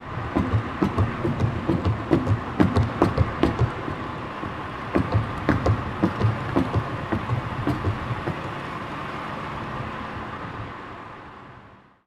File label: Car Tires Asphalt; Speed Bumps, Close On Board Perspective 2x